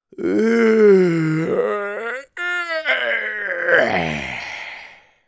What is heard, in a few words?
middle aged male stretch and yawn